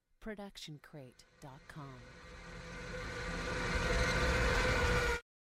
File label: Creepy Reverse Riser; SoundsCrate